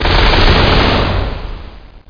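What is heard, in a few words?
weapon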